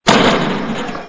serve; 着信音やアラーム（目覚まし）音に使える、着モーションとして; FOMA

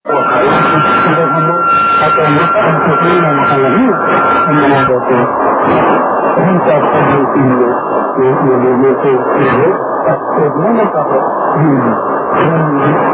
Beat test

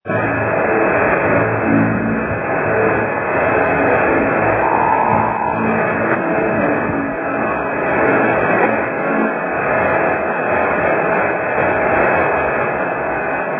5050 kHz

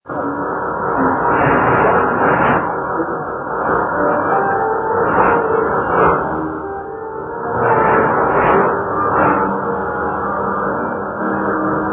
5010 kHz; Malagasy

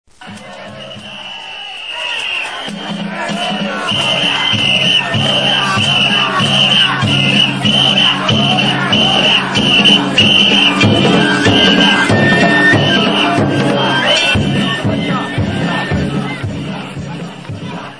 danjiri sounds

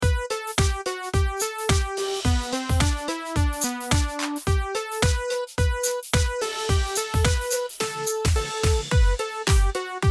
Oklahoma Mixer

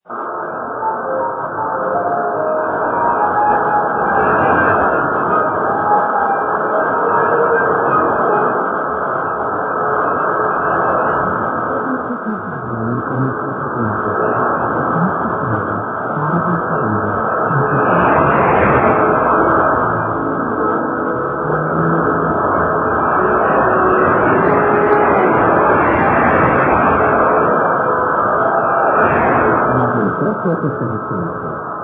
Faro Del Caribe 5055 kHz

